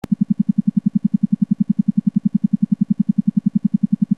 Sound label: 10Hz equal phase